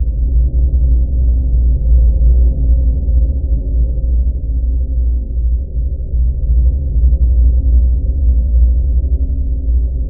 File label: deep ominous industrial d